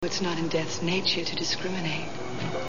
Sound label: celesta